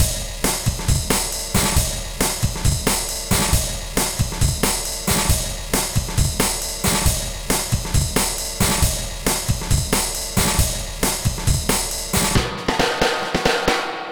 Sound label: Drmz, Lounge 136; Vegas; Zero-G Kocktail Kollection; Construction Kitz (Lounge); Vegas Time 136